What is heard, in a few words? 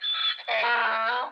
donkey, Nature animals; Alexander